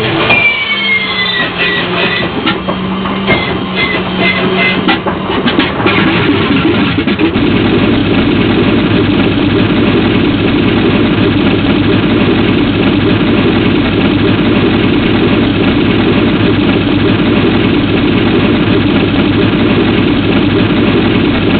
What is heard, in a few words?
radial startup